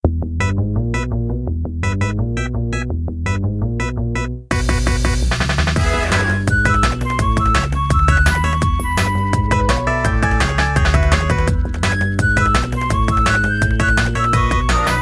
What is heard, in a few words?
Tough Enemy